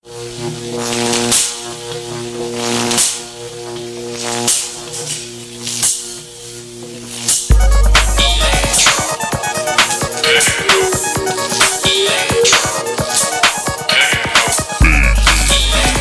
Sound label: Electro Techno